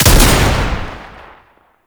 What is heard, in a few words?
Bayonet Fire; Warframe; Tau; Roathe; Weapons